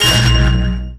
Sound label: Kill sound beepo